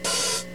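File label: hi hat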